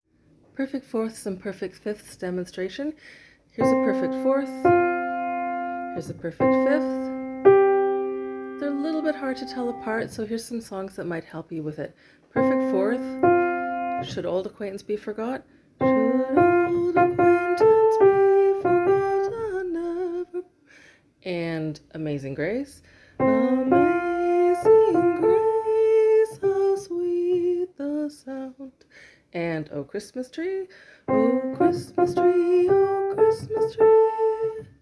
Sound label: Perfect 4th and Perfect 5ths demonstration; Interval Identification